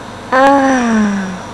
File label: "OHHHH"; gemiti esclamazioni mugolii